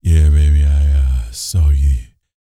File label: Vocal Planet R&B Dance BASS CATCH; Spectrasonics